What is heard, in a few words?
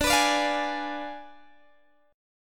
D Diminished 7th D, Guitar, Open G